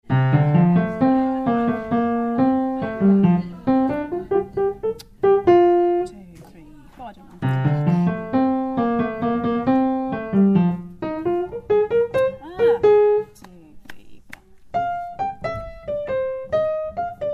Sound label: Pipe hi